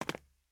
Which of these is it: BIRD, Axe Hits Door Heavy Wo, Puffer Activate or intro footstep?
intro footstep